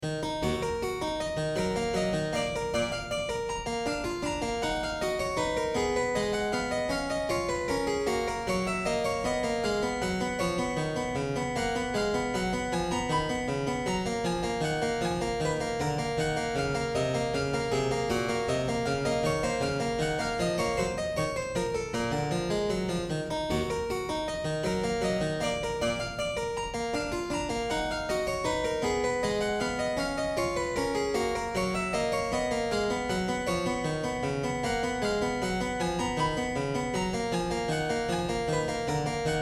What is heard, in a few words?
Prelude Harpsichord; BWV 938